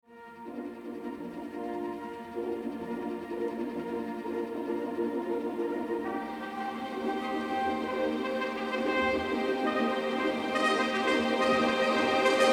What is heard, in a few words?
Symphony No. 4 in E-flat Major III. Scherzo. Bewegt Trio. Nicht zu; Bruckner; Romantic